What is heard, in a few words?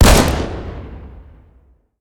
rifle fire incendiary, gunfire